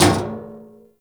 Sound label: Physics Barrel Impact Medium, Metal Barrel; Warframe; Physics Objects